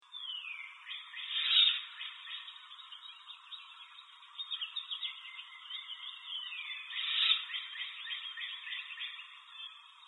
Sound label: nestling, owl